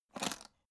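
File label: aluminium case small open short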